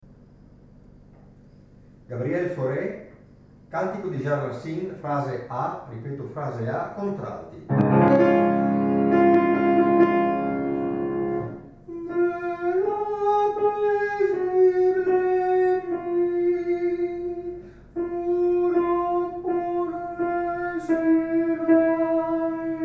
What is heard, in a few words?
Contralti; Faure; Racine; Frase A